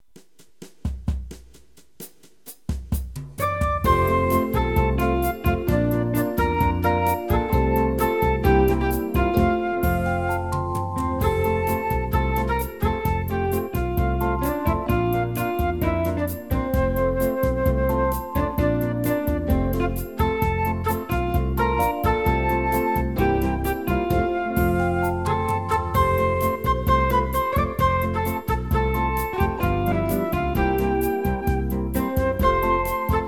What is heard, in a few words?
bossa nova